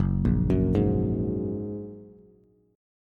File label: Gb Major Add 9th, down, Bass; arpeggiated; JGuitar